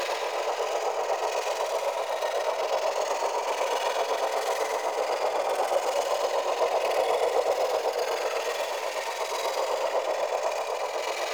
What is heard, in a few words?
Gloom Active Loop; Warframe; Wraith